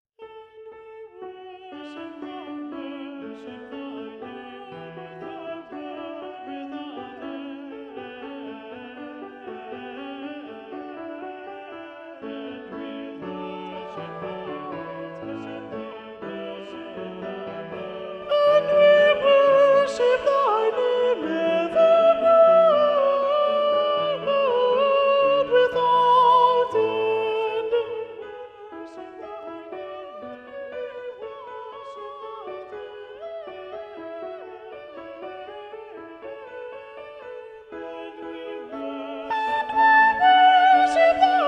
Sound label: Soprano; And we worship Thy name; Handel; Te Deum